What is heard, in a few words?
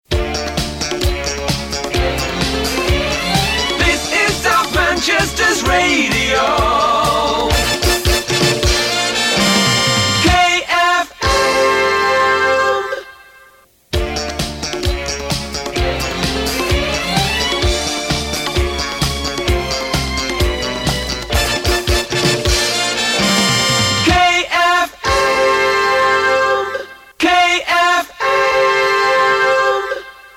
Jingles; KFM 94.2; Stockport